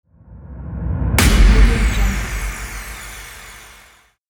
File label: impact sound effect